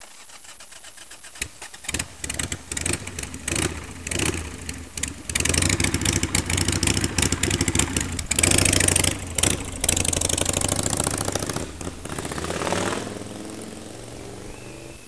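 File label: harley davidson, vehicles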